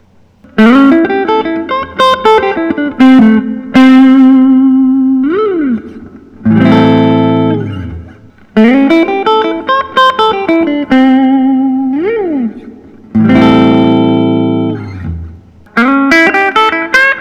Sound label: knaggs ssc t2; sunflower burst